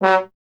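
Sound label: bass trombone F#; Miroslav Vitous; Solo Instruments VOL-1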